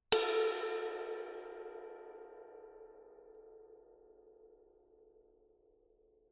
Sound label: master 21 extra dry ride body, paiste; masters